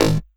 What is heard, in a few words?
PERC, 160 BPM; Ueberschall; Drum & Bass Flash Trackz